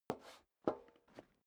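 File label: step dress boots metal dry scuff, footsteps; schritte; menschen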